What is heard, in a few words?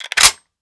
mag7 clip in; BalasToxicas